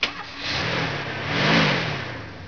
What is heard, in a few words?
car engine